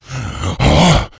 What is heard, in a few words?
rage, infected, male